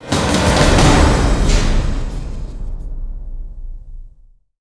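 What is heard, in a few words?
sting ancient secrets perc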